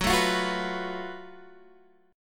F sharp Minor Major 7th Double Flat 5th, Guitar, Half Step Down